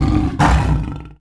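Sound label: lion attack vox; Civ4 warlords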